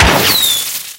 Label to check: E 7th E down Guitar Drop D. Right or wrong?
Wrong